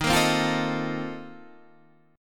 C Minor 13th Eb

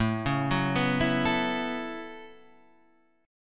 A 6th Add 9th A down, chord; A6add9; arpeggiated

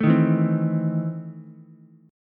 E Major 7th Suspended 4th Sharp 5th Ukulele; strummed; JGuitar

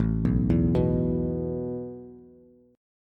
A Suspended 2nd A Bass; Asus2 arpeggiated

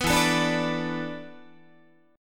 E 7th Suspended 4th, Guitar; E7sus4; strummed

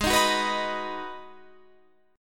B Major G sharp, B/G# chord; strummed; JGuitar

